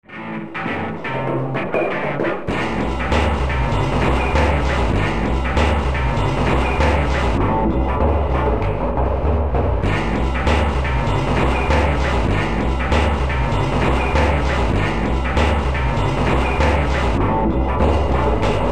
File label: lofi